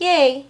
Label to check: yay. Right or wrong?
Right